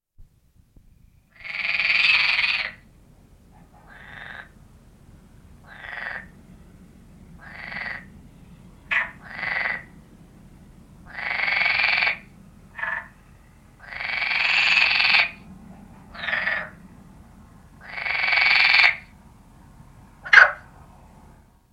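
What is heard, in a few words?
common frog; bbc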